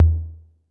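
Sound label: 808 low tom; Crypton - DrumBase5000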